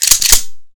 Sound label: m3 pump, weapons